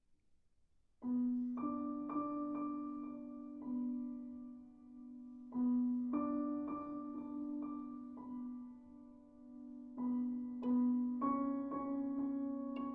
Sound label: Sonata For Violin and Piano, Intermezzo